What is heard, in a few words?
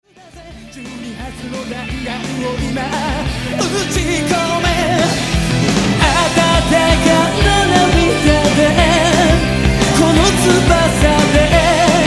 Ballad